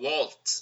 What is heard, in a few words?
WALTZ